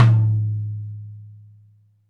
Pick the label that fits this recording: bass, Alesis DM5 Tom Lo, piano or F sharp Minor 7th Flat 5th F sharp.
Alesis DM5 Tom Lo